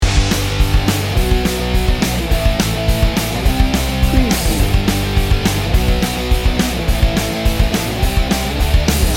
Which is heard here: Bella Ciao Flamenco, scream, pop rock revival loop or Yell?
pop rock revival loop